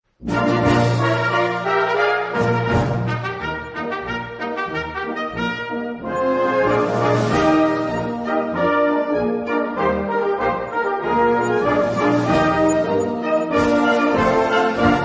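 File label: polka française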